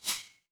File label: impact sword draw